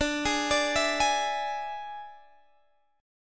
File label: D Suspended 2nd Flat 5th D, Guitar, DADGAD